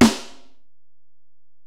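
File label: Acoustic Snare, Alesis DM-PRO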